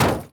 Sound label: obstacle space